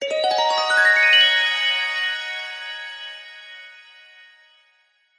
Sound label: sound design harp glissando dreamy washy